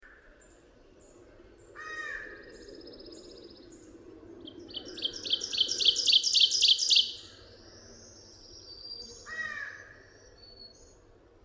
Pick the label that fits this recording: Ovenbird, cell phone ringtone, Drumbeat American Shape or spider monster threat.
Ovenbird